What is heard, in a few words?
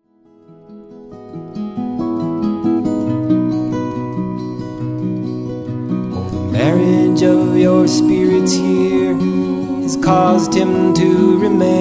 the wedding song, acoustic duo